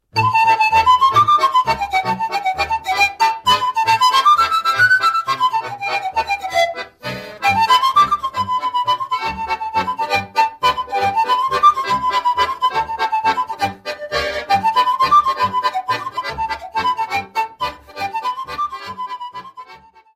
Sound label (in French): Geamparaua, Roumanie